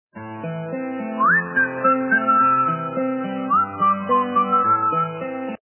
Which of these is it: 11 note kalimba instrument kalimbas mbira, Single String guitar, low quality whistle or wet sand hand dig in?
low quality whistle